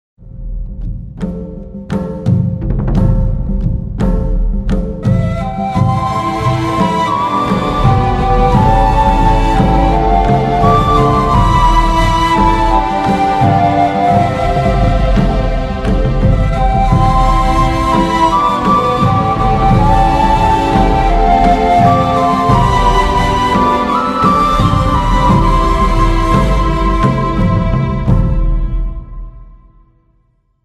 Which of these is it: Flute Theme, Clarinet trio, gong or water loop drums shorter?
Flute Theme